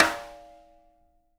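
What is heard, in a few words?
jazz snares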